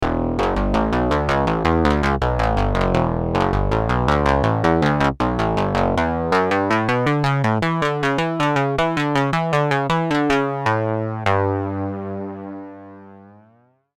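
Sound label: Analog Squire Bass, synthesizer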